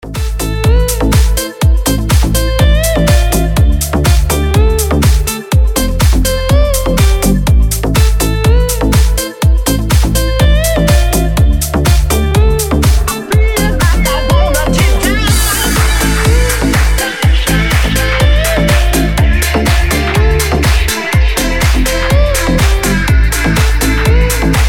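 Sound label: ringtone melodic nu disco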